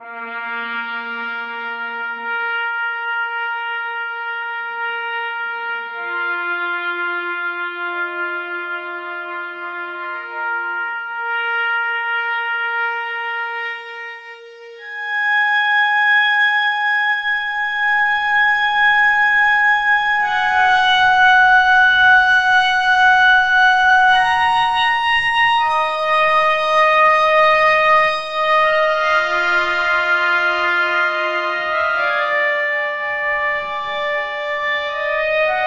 a sequence of three urgen, religious

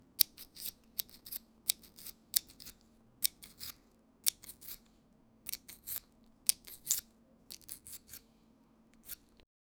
a knife gently cutting th

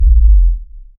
short fail sound effect s, descending tone

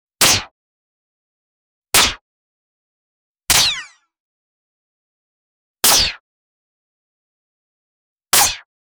melee weapon slash

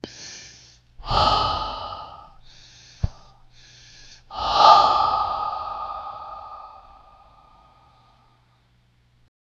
last breath 60 years old, aging

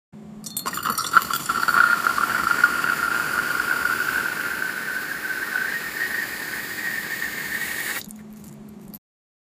the sound of a jar, liquids

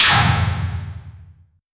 anime shield clang echo; fighting

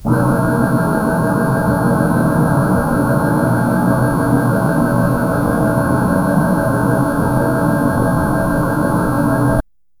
payment app transaction, alert

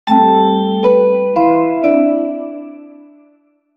a player fails to win

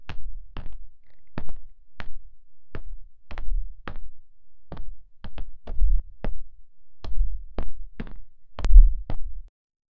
generate a footstep in ps, ps1

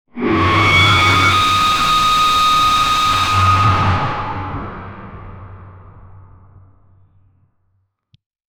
intense piercing metallic, creature

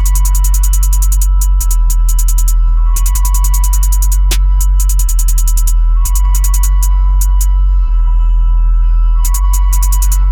808 loop with 155 bpm

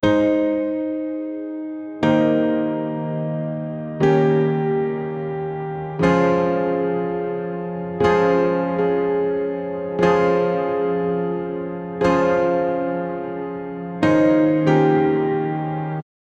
melody piano phone call

